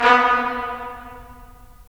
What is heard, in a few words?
BRS, Tps Stacc; Roland - LA Composer vol. 4